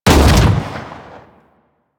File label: Fire Shot, Weapons; Strun